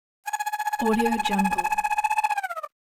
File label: device beep wind down